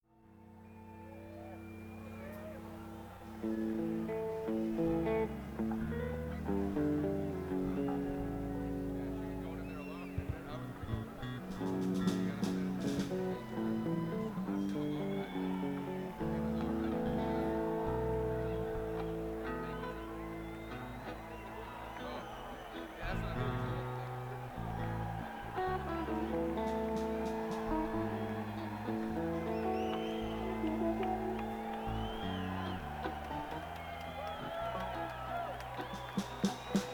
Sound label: Tuning